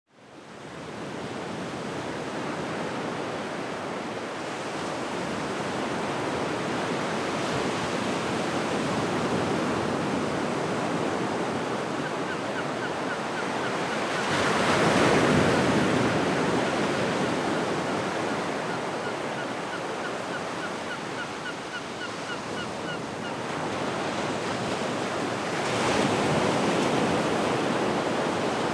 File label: Whale Bay Dusk, Northland NZ